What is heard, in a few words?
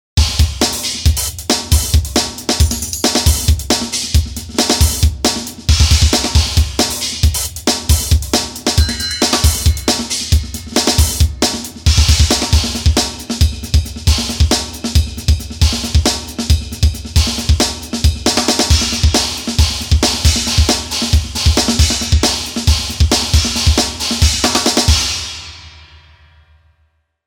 Drums